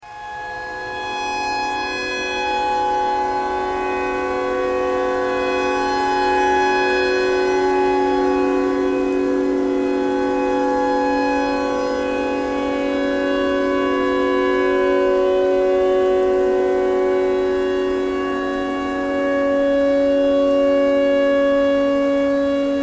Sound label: tornado siren